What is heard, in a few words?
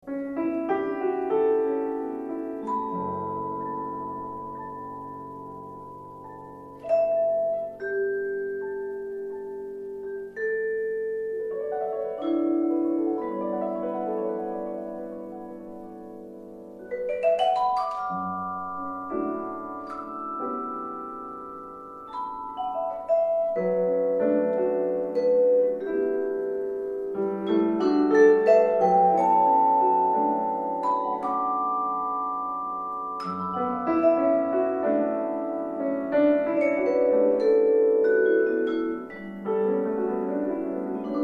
improv, percussion